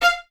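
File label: Violins F 5 staccato left; Miroslav Vitous; String Ensembles; 11 Violins; 11 VS Stacc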